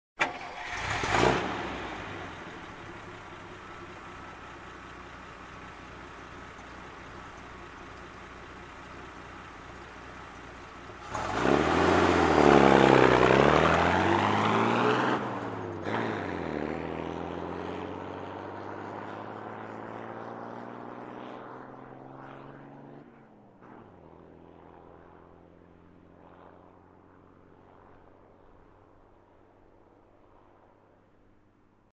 Military jeep engine start and drive away

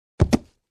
Boxes being stored

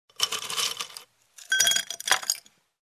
Filling Ice Cubes in Drinking Glass

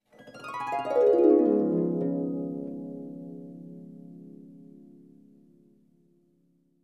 Dreaming sound effect descending glissando

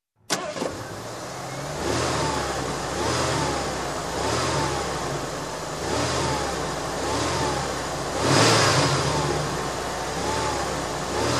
SUV engine ignition running

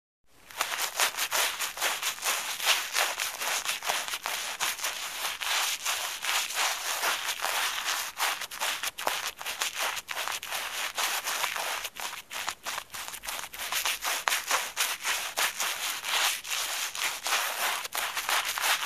Shoe Brushing

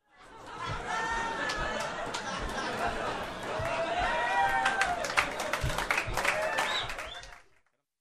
Audience Laughing and Clapping

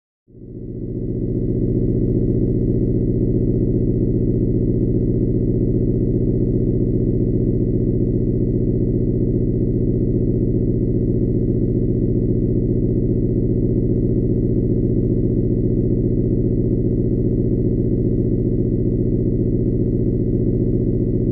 Machine deep vibration noise

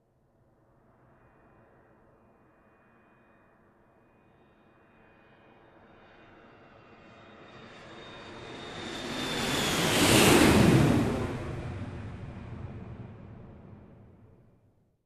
Airplane Lifting Off

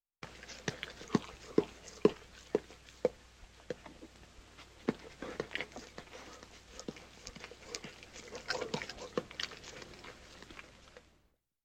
Gum Chewing like sound

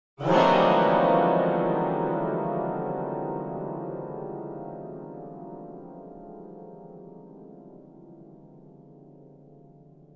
Inharmonic glissando

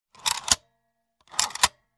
Small storage box open and close

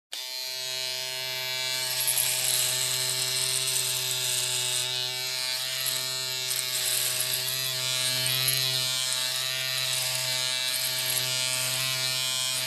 Electric Shaver

